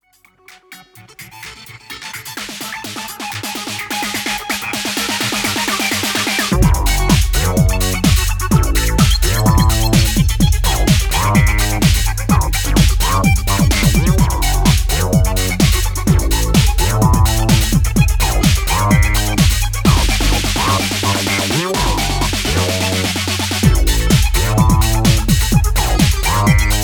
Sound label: Bass Interaction, Acid